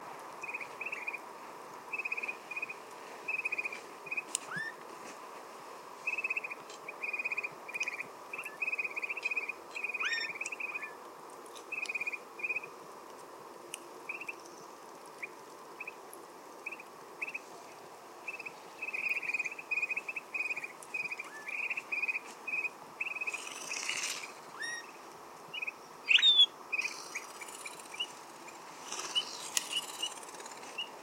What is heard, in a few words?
Pine Grosbeak, Pinicola enucleator; cardinals tanagers grosbeaks